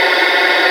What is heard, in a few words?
String Scrape; Proteus 2000; Digital Sound Factory Proteus 2000